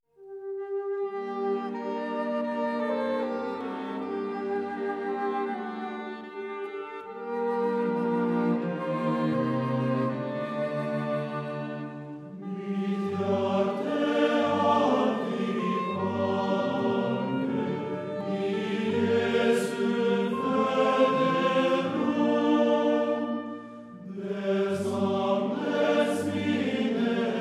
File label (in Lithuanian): Kalėdų giesmės; Norwegian Christmas Song